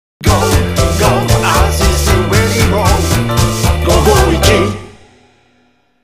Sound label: jingle short